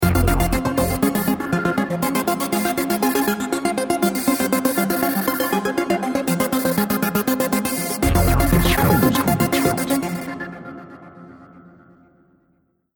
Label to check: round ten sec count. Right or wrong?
Right